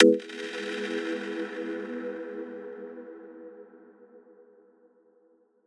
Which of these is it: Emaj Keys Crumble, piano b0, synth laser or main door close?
Emaj Keys Crumble